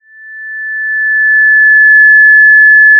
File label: Sound of a police car siren